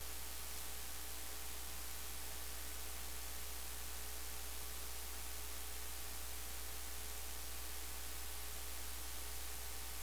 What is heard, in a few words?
Middle Room Chandelier Bulb; Electronics Of My Great Grandma's House